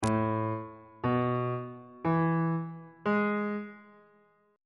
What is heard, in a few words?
a major 7th suspended 2nd chord intervals